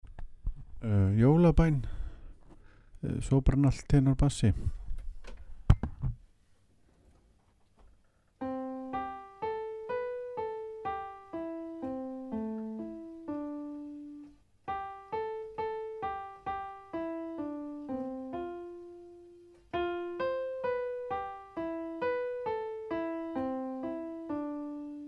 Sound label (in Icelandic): sopran alt tenor bassi